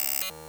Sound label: Perc, Data Mosh Hits; Other Perc; 99Sounds; Mangling Audio